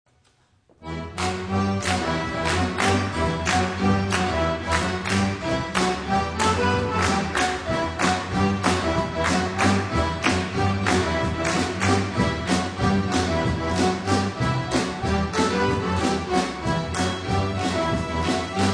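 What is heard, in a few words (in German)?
Hoerprobe, Akkordeonorchester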